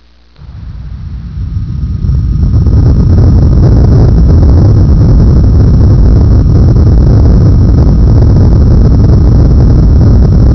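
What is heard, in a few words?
earthquake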